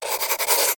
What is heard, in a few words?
pencil write